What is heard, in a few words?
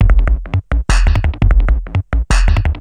Beats, Industrial